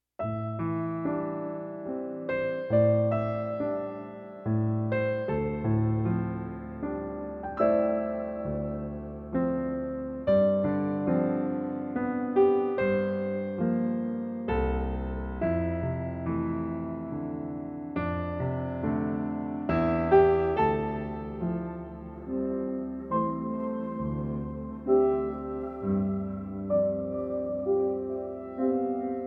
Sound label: Mellow Imperial